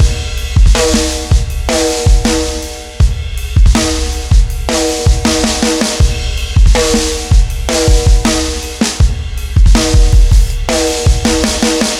Real Beat D, ambient; ambient vol 2

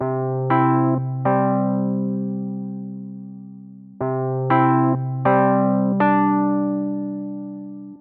Warm EP; Клавишные, сэмпл; Warm Electro Piano (Rock Alternative); 120 BPM; Keys; рок

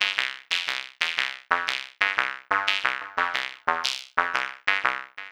Driver, синтезатора хип хоп; сэмпл синтезатора

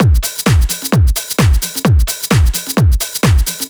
Niner Beat C

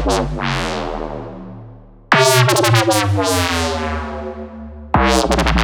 Falling Wobbler

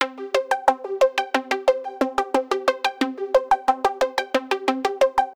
Filter

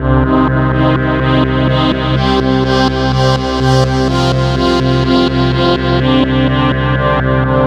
Melancholic Pad, Звуковой фон Дэнс Электро

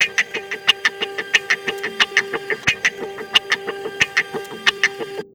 Guit String; Hip-Hop

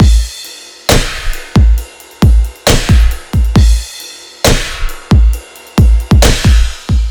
Dome Kit; Dubstep

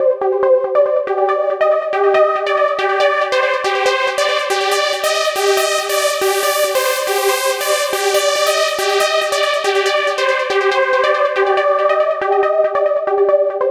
Big Sweep; Сэмпл синтезатора (Техно-транс); Techno Trance; 140BPM; loop; Synth

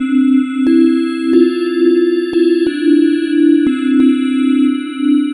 The Bells

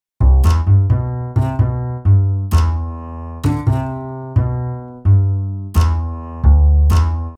Melody, latin

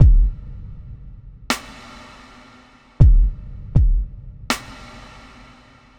Cellardoor, ambient; ambient vol 2

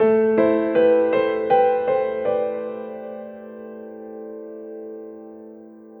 Grand Piano; фортепиано

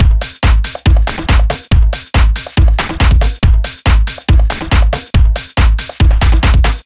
Elementary Beat C

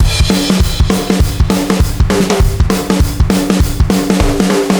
Britbeat; Ударные и барабаны (Рок, Поп); Rock & Pop

